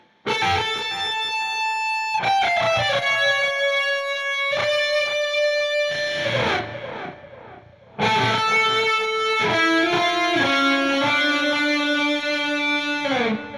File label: Toccata Guitar